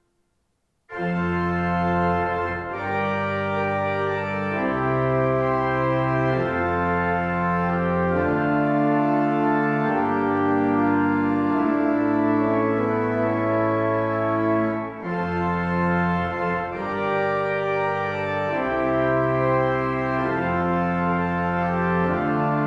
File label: Fanfare; Fanfare som jeg lagde i Orelund Kapell, jeg bruker den blant annet som ringetone.; Venheim Orgel Orelund Kapell; ZOOM H4n